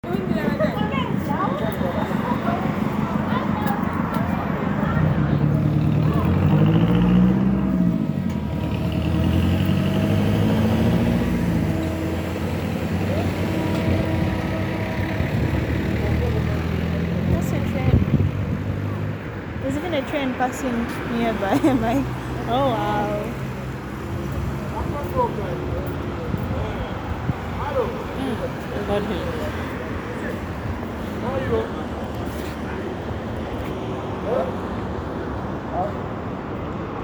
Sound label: Cairo Road